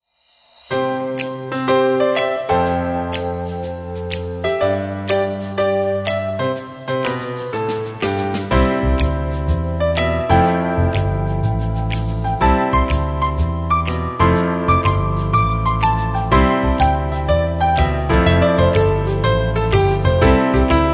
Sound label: jazz night